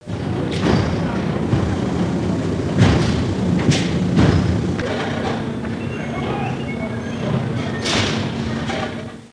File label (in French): travail; MOBICLIC 13